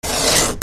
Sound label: FRICTION